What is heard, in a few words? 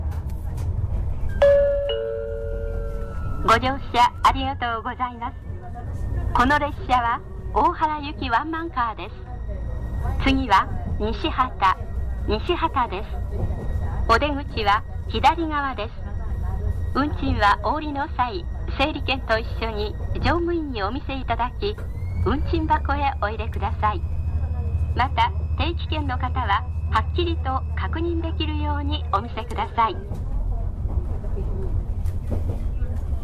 train; isumi